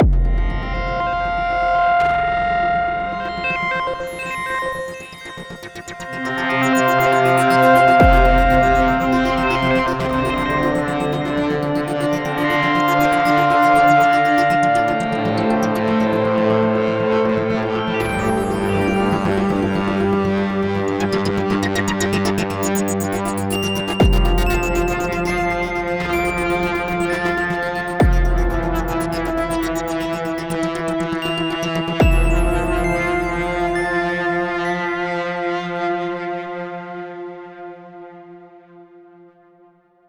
envelopes and 4 lfos

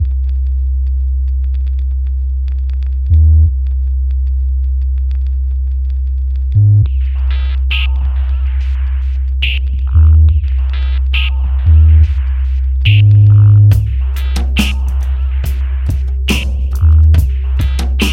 reese bass

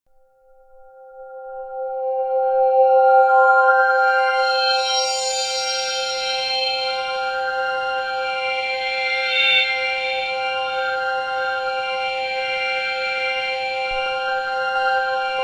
glass bird

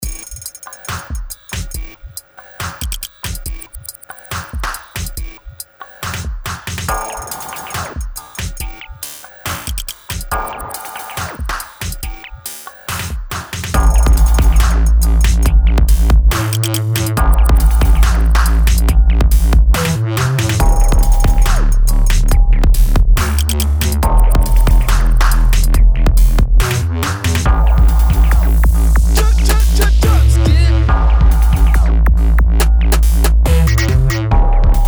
dubstep

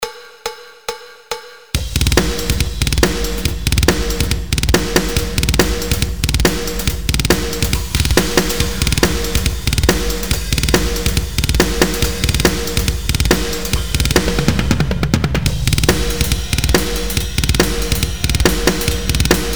taldrum icebergdrums squashed

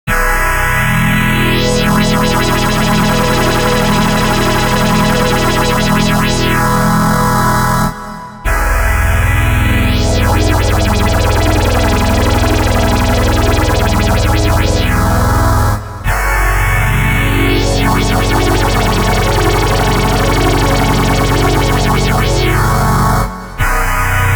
rhytmic

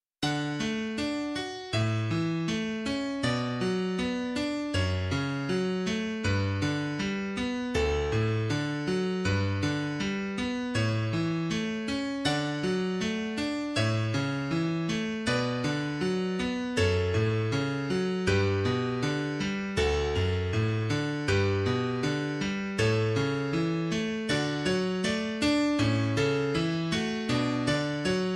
canon in d

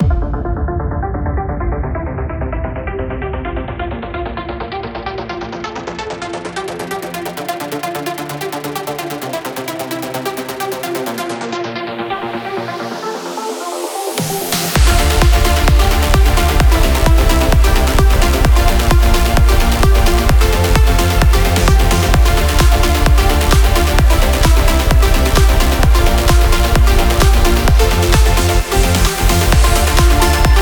trance

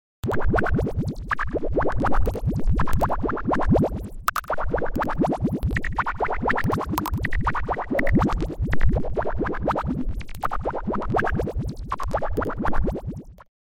seq rhythmic magma

